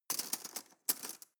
cutlery teaspoon drawer handle

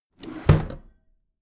packed drawer smooth close heavy halfway

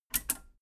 metal button radio click power on fast

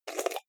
jar plastic midsize open fast

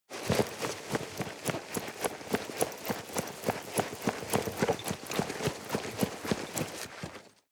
nylon leather run sequence

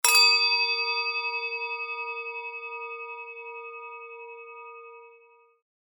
japan rin bowl crash

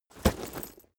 leather metal impact

